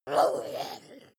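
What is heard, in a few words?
witch groan short, creatures; kreaturen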